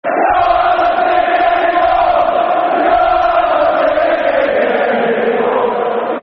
You're Not Singing Anymore, Football Chants (Soccer Song); Chelsea Cfc